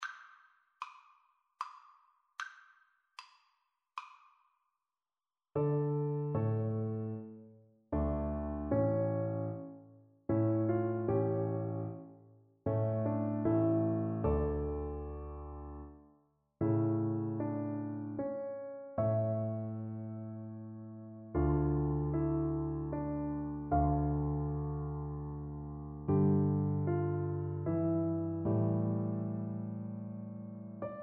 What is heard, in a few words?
clarinet